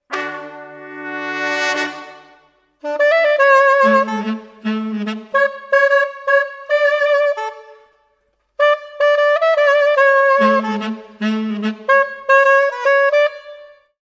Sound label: Brass, Jazz for piano